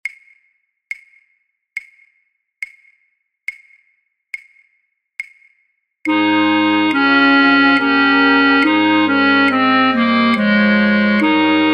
flute choir, Altfluit 2A, Altfluit 2B; voor oefentracks; Matthaus

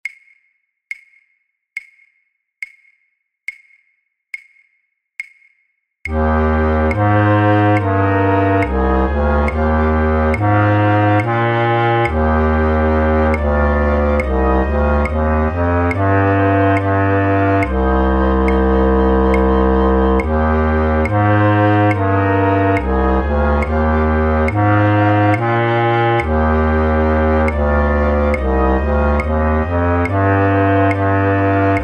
flute choir, Contrabasfluit; voor oefentracks; Matthaus

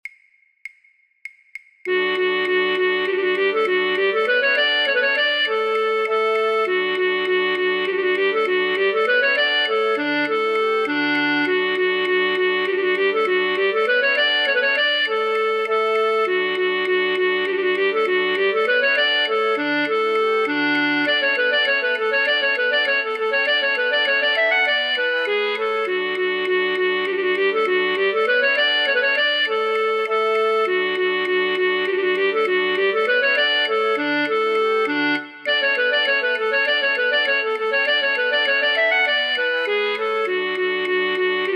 flute choir alle partijen fluit; voor-oefentracks; Contredanse; Rameau; Platee

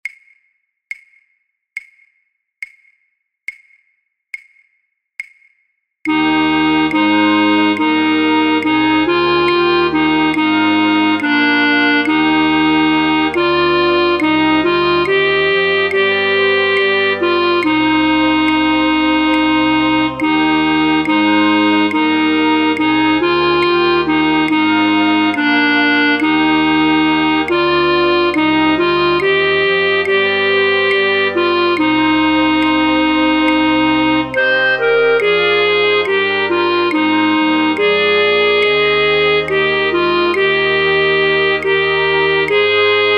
flute choir, Fluit 3A1, Fluit 3A2, Fluit 3B1; voor oefentracks; Matthaus

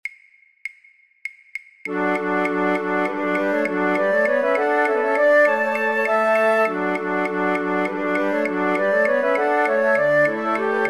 flute choir alle partijen; voor-oefentracks; Contredanse; Rameau; Platee

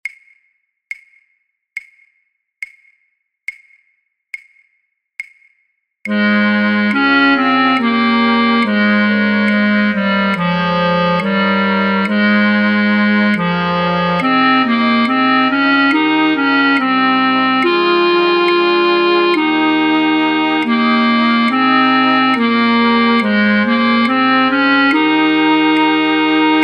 flute choir, Basfluit; voor oefentracks; Matthaus

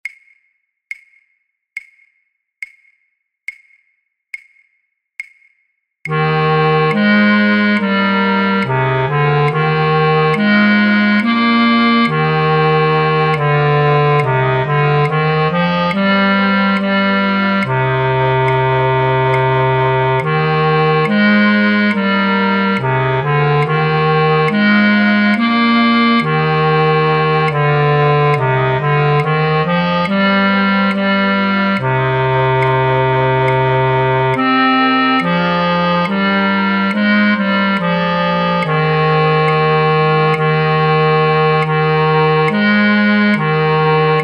flute choir, Basfluit; voor oefentracks; Matthaus

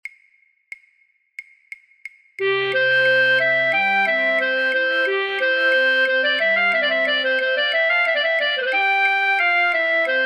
flute choir fluit; voor-oefentracks; Marche pour la Danse; Rameau; Platee

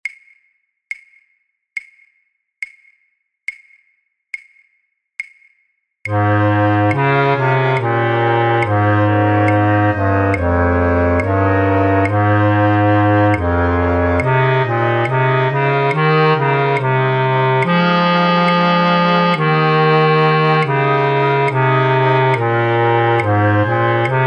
flute choir, Contrabasfluit; voor oefentracks; Matthaus

